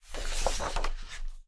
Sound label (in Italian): Mappa; BLIND QUEST - The Enchanted Castle